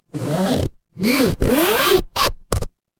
paper fabric zipper

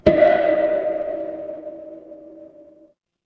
instrument wood block

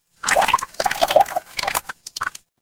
water swirl

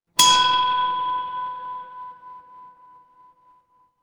instrument bell

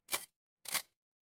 office cash register

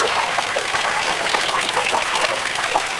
water stream

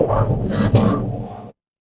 magic scifi alien

